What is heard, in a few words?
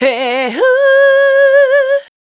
VOC F, Dance Vocals, FEMALE